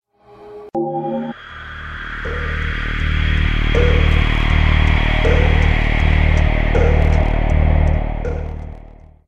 Jingle Success Normal; neighbours from hell portal through doors

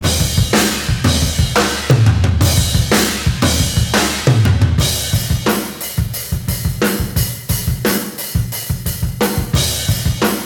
end stage; Speed Blazers

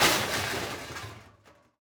metal break; teardown; ps5 windows xbox series xs

